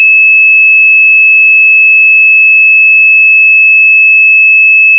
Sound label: Blue Box Sound; Phreaking